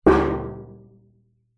impacts metal hollow small hit resonate; zapsplat

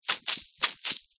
office sponge brush wet paint squeeze fingers; zapsplat

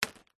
foley stone single drop onto plastic lid; zapsplat

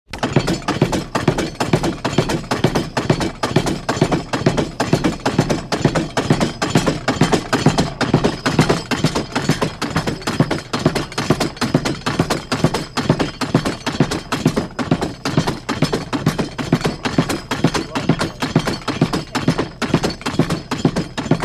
industrial machine pounding machine; sound effects one

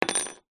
household key mortice drop down wood floor

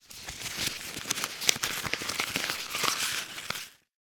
money banknotes australian polymer handling; foley; zapsplat

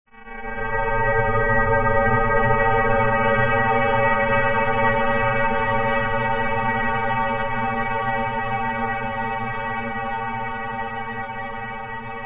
sound design metallic long reverb tone; zapsplat

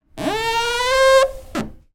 household furniture hand rub squeak polished wood

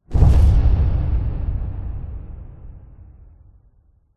explosion big powerful internal; zapsplat

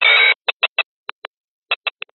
science fiction radio signal interference burst

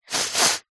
human child girl 10 years old sniff snotty cold

